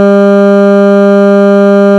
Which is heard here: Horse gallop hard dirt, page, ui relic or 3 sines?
3 sines